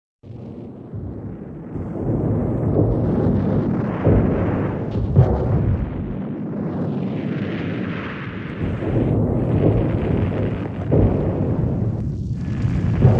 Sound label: Barrage distant 2nd world war